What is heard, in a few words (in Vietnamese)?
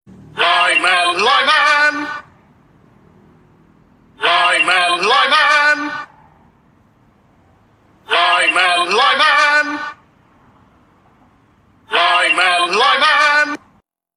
am thanh thong bao; line man